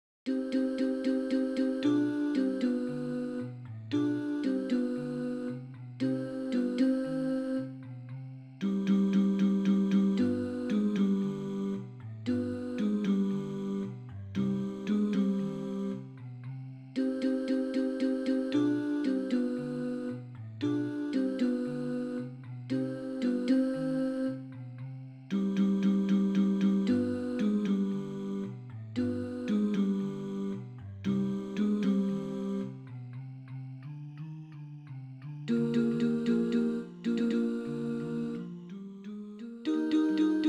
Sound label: tenors